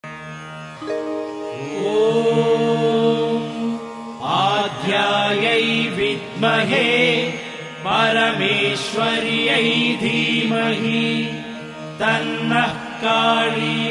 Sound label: kali gayatri